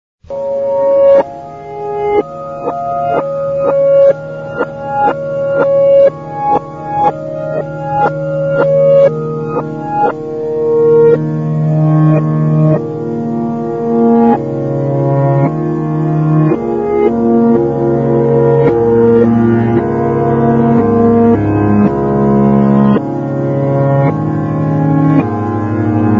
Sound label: improvise piano back pitch